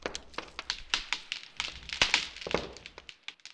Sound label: ice crack, environment; Tribes2